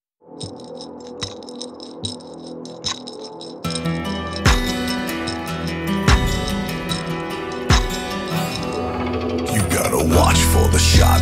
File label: acoustic